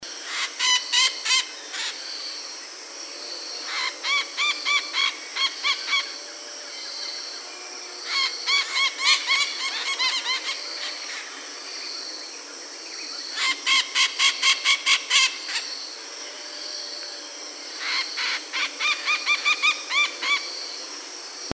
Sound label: White eyed Parakeet Psittacara leucophthalmus